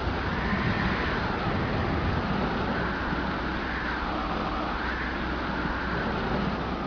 wind

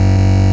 Hum Low

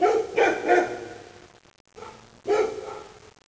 dog bark

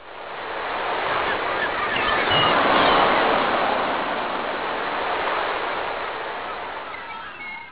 OCEAN SEA

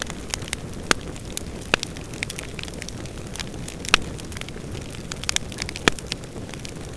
fire chimney; cs manoir

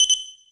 accept

